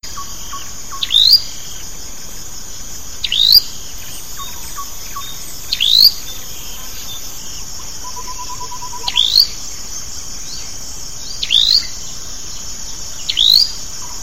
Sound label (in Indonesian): cinenen kelabu call